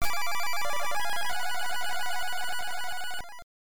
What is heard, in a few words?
Level Start; Cliffhanger; NES